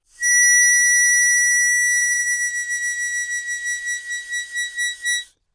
Mouth-organ, Accent, Type 3 - High Tone, Clean Version Of Type 2; woodwinds harmonica